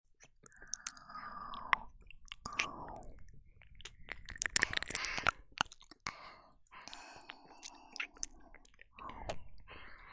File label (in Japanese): 濃厚両耳舐め, ASMR